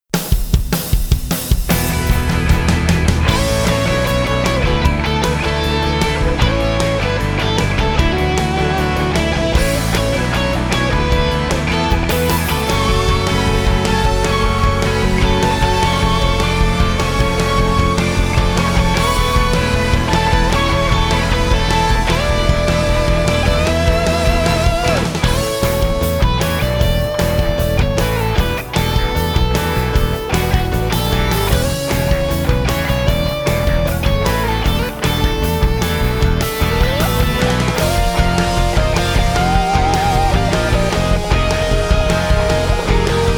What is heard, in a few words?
Exit Music; Bows; freaky friday